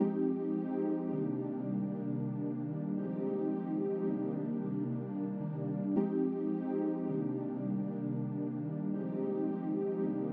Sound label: 161 BPM C Min Pad, loop stems; Melody; Cymatics; 2022 Melody Collection - Wavy; Location